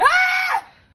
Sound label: yelling